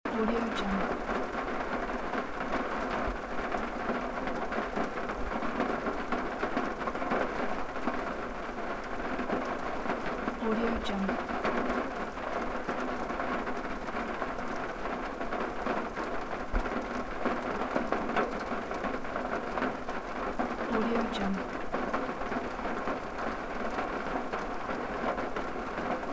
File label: rain on car roof